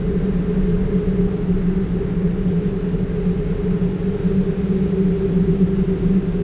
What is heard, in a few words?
dungeon loop; svencoop; underrain